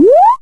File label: jump, Retro Sounds; Kenney's Sound Pack; Retro Sounds 1